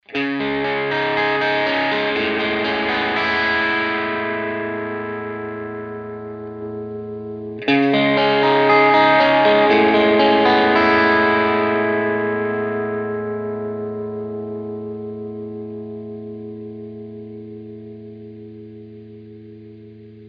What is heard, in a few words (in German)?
Le Crunch Kanal AB cold Strat Steg PU erst nur B dann AB; two notes; wall of sound iii